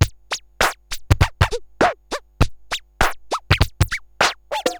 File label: Moog Freqbox RX17 (100BPM), cyborg beats